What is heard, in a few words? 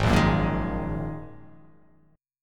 Ab 7th Suspended 2nd; Ab7sus2 strummed; 4,1,4,3,3,3,x,x